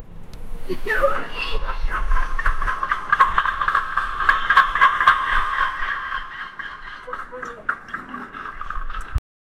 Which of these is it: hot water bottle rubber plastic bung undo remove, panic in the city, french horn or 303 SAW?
panic in the city